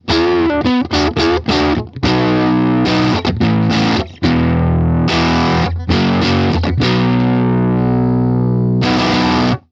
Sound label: Stratocaster Middle and Neck Dirty